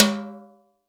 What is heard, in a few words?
kit, Yamaha Motif-ES8